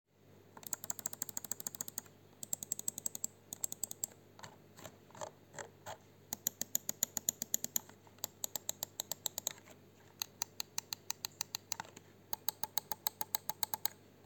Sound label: thermaltake level 20 rgb, souris; test peripherique